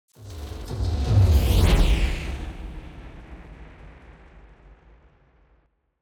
Metallic Dystopian, Whoosh Sweeteners; SoundBits; Just Whoosh 4

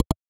switch, Interface Sounds; Kenney's Sound Pack

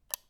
switch, UI Audio; Kenney's Sound Pack

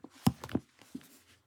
BOOK Book, Open, Paper; Books Alive

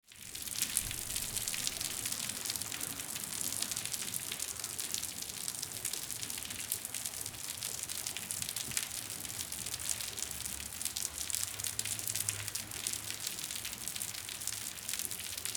Rain On Concrete Water Dripping In Alley; City; Rain in the City

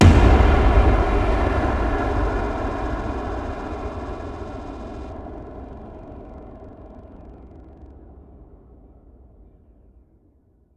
Glitchy Wind; 99Sounds; Rumore Cinematic Impacts

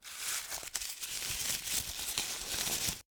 Short Crumple, Paper; Sounds Hunter; Paper Sound Effects

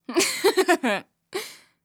Female Laugh; AudioDesire

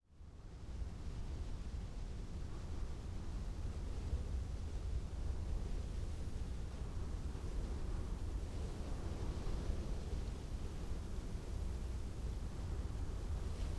AMBIENCE Desert Soft Day, Nature South Africa; SmartSoundFX

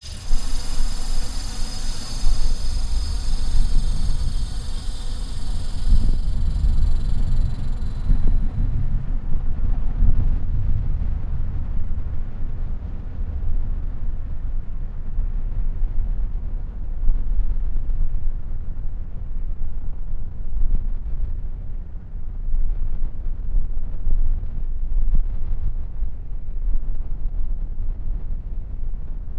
Eerie ambience; Bluezone; Eerie Ambiences and Sound Effects

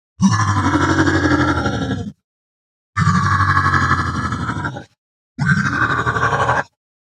Creature Scream, Sci-Fi Invaders; Mechanical Wave

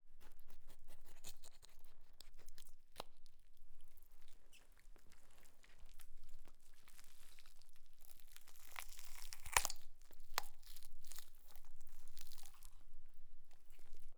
INT Wet leg cut off, Deer; Coll Anderson

